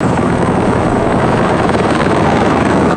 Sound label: fast helicopter rotor blade flyby; aircraft